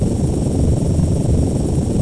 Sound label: Attack Rotors Stereo; gunship